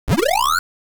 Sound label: ghost eaten; stereo; original